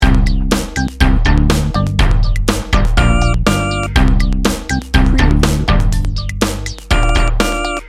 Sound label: swagger groove loop